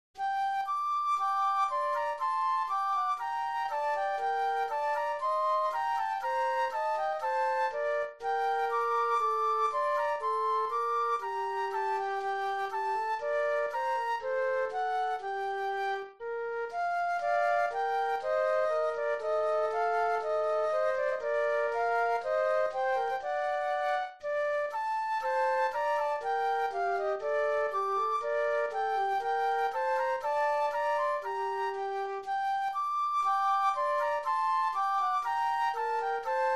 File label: Gavotte Flute Duet; classical; Lully